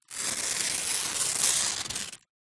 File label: household baking grease proof paper rip